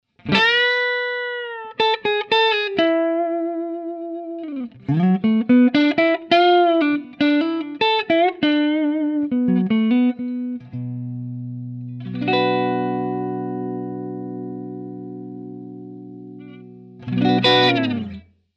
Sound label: gibson les paul standard clean single notes hals normal